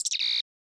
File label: Communicator